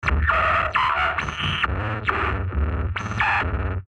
Electrical Guitar Stab Glitches Stutter; Production Element Imaging Element Accent Transition

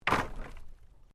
Wood Planks Fall

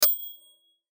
chime hit bell, Chime Hit Ascend Thin; Chime Hit Ascend Thin Production Element Imaging Element Accent Transition; Multimedia; Chimes; Blastwave

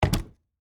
Wood Object Down, Set, Down, On, Wood; Foley; Materials

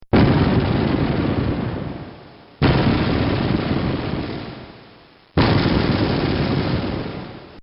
Mortar Cannon Explosion; Guns; Bobby Cole

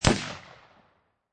Machine Gun Ak 47 Single Shot; Machine Guns

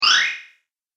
Magic Power Up; Magic Sound Effects

